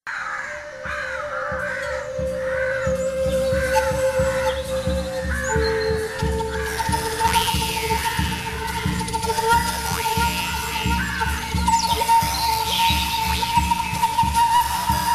oiseau mystere musique chamanique tambour

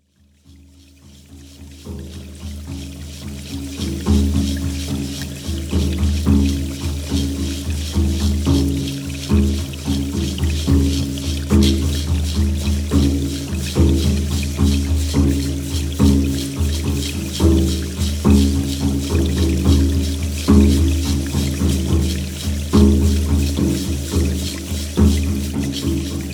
wesak musique chamanique chamanisme

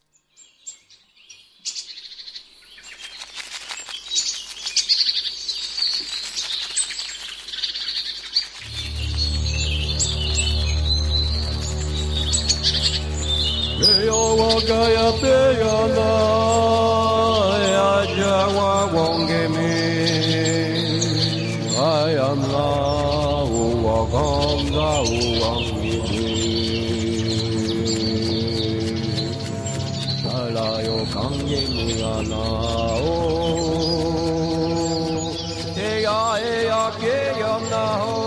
natural musique tambour chamanique

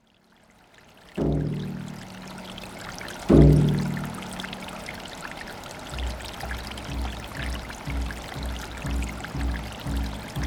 tambour chant eau

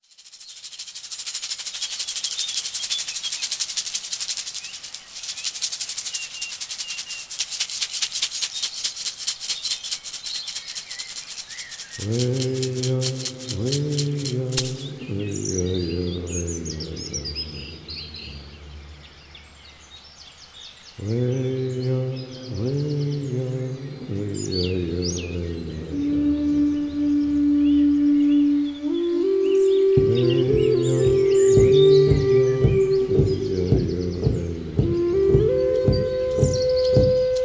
oiseau mystere musique chamanique tambour

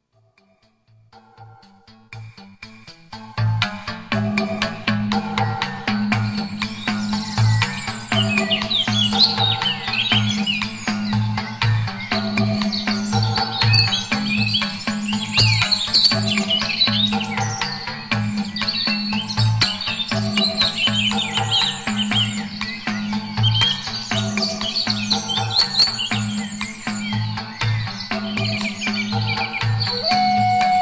celebration musique chamanique tambour

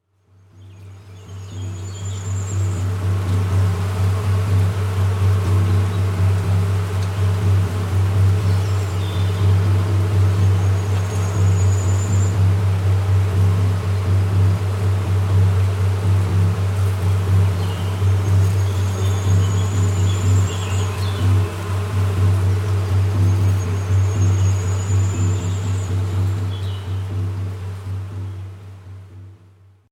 voie tambour chant abeilles

